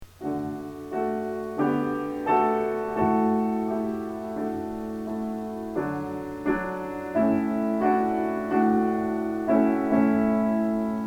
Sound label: Ode To Joy Piano; Ode To Joy from Symphony No. 9; L.V. Beethoven